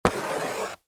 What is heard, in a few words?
Draw Line